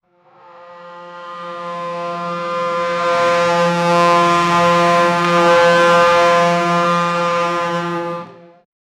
f1 som de curvas, car

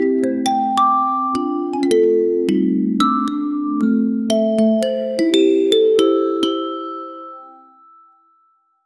som suave de guizos

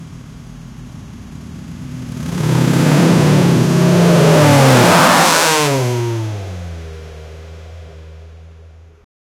crie o som da moto; motorcycle

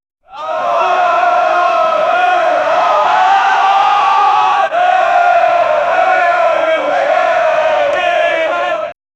soldados romanos inflamad

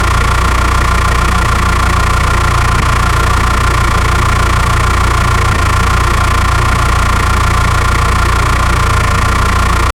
som em loop do motor; automotive

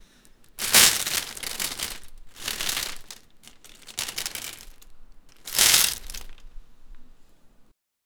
som de frutas sendo coloc; produce

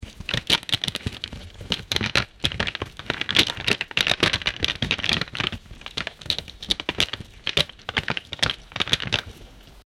vasculhando, rummaging

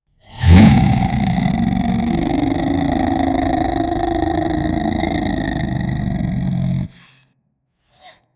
som de monstro rosnando; furious

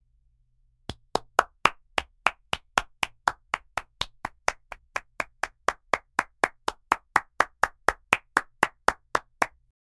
algum batendo palmas com, rhythmic

